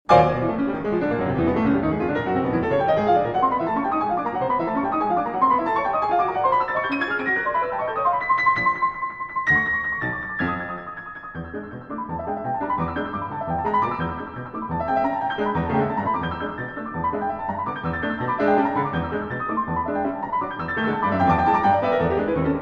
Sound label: Piano Sonata in E Major IV. Perpetuum mobile Allegro Vivace